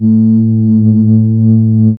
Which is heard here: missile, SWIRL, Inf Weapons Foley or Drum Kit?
SWIRL